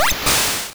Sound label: Water Gun; sfx; attack moves; pokemon; gen 1; rby